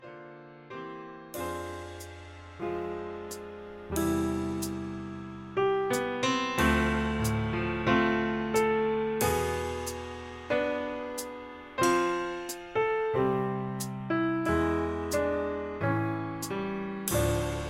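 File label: Instrumental NO background vocals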